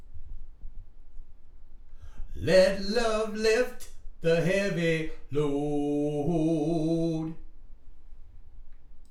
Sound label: vocals